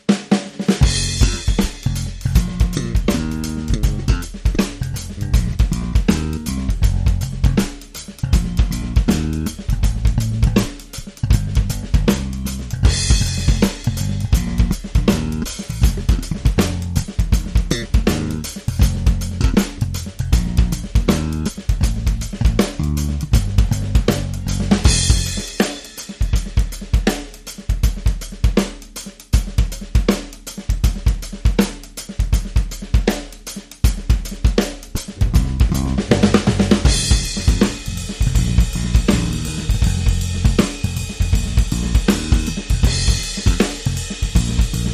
Drum & Bass

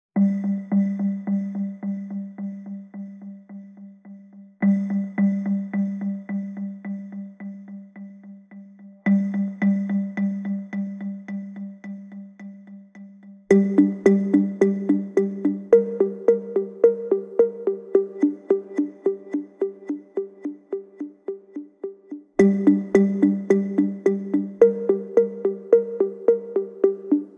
Dangerous Quiet Lead Motif Only

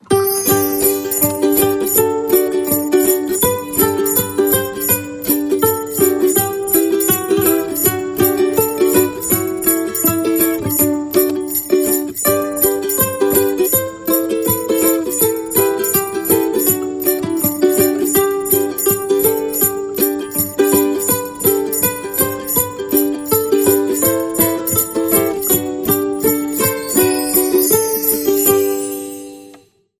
Guitar Ukulele Bells

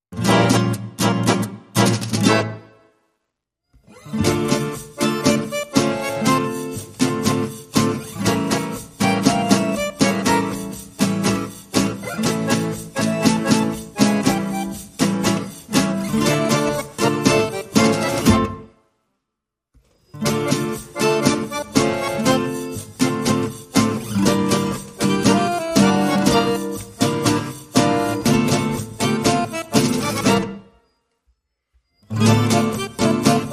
No Bass No Fiddle

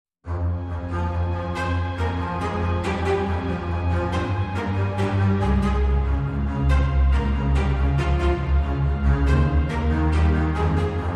Strings Mix

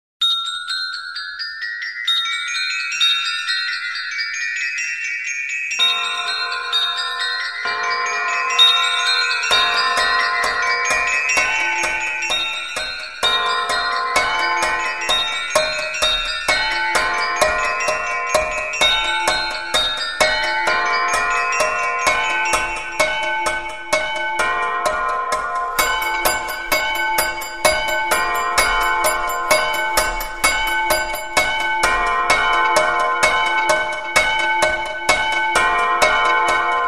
Lots Clocks

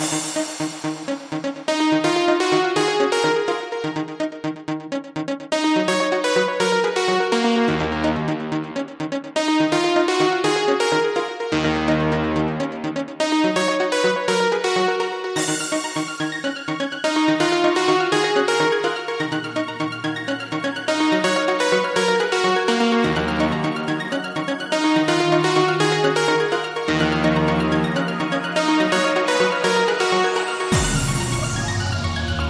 Arpeggio

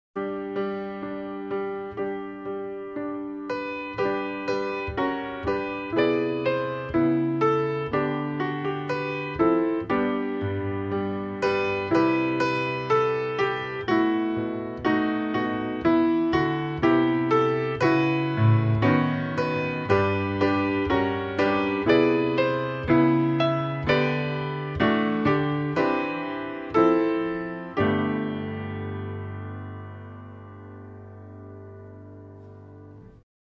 Oh Little Town Of Bethlehem, Solo Piano

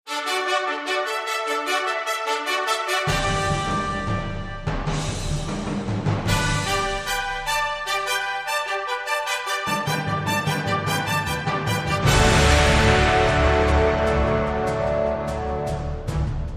American Fanfare

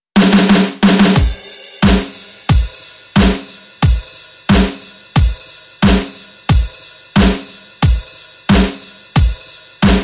Drums

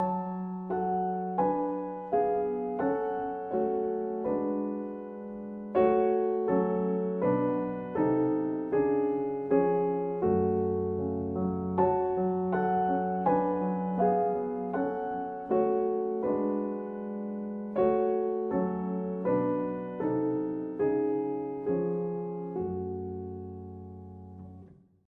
Solo Piano

